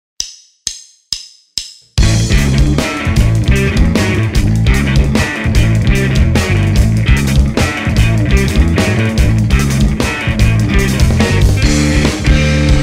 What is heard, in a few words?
BACKING TRACK